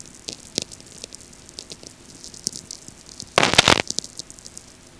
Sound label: VLF; StMichel